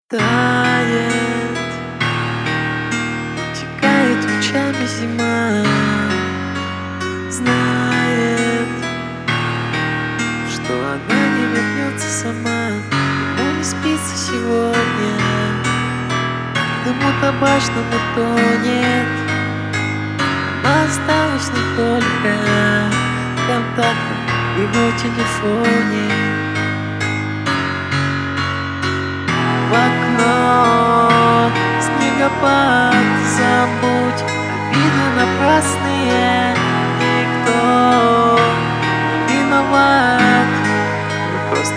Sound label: cover acoustic